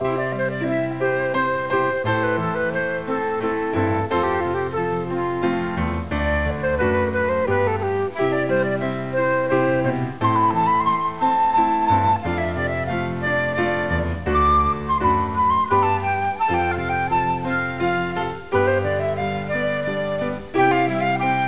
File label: FLUTE; cdromtoday